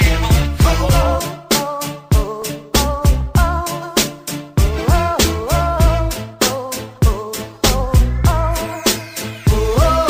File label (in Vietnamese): Nhac Hiphop